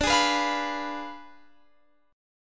D Diminished 7th D, Guitar, Standard; Ddim7 strummed; x,17,18,16,18,19; JGuitar

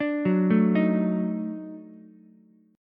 D sharp Minor Major 7th Flat 5th D sharp Ukulele; arpeggiated

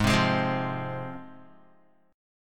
Ab 6th, Guitar; strummed; JGuitar

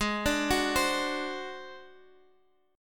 Ab 7th Flat 5th down Guitar Drop D

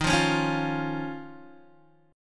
D sharp 7th Suspended 2nd, Guitar, DADGAD

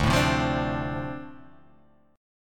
D-Minor 7th D, chord

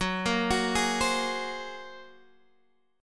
A sharp 7th Suspended 2nd Sharp 5th F sharp

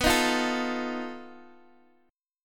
B 7th Flat 5th B Guitar DADGAD

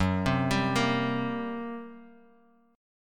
G Minor Major 7th Double Flat 5th, Gb

